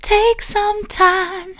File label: take some time soft, vocal